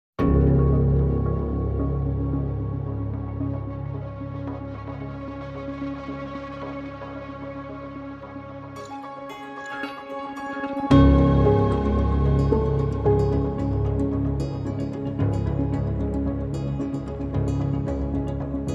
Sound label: Building Forward, No Drums